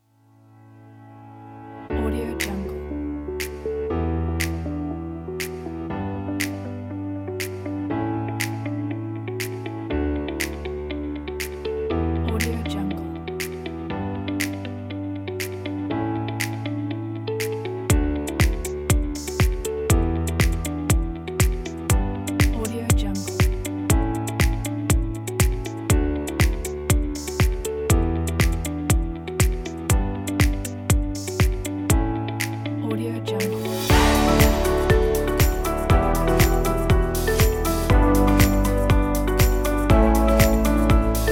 motivation news

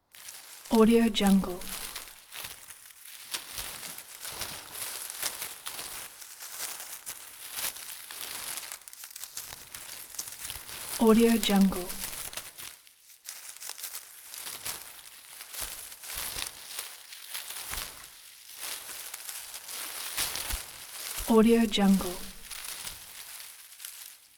forest leaves

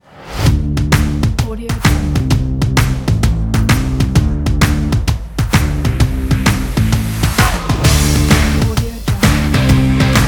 fitness workout kit

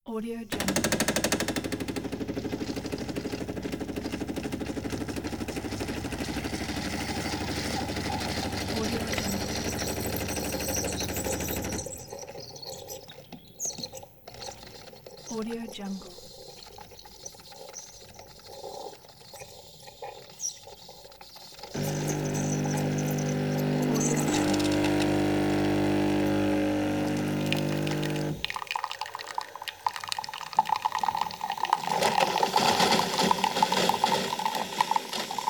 coffee machine

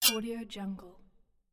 knife scrape

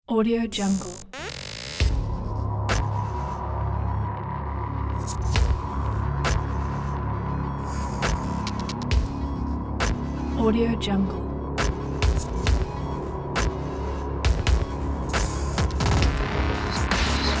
horror rock trailer

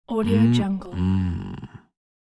mmmmmm from satisfied male; sound-ideas

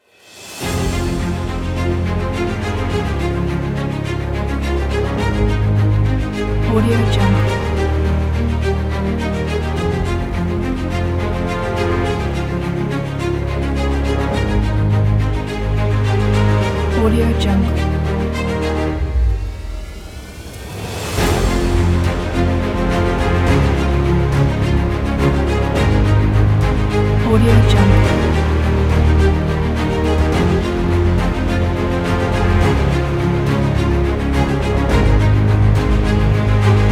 epic cinematic

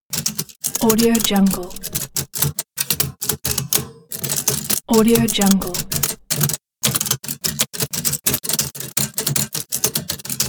typewriting pack

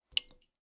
water drip into ceramic bowl pings; prosoundfx

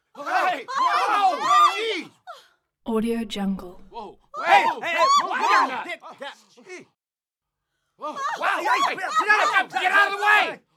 crowd panic short bursts of small group; prosoundfx

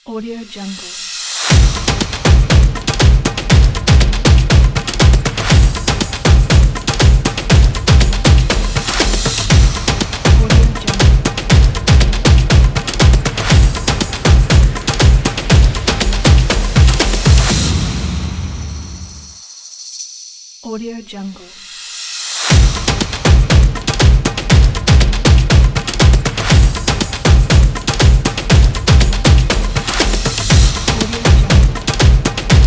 rhytmic stomp and percussion

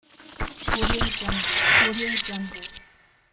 glitch intro

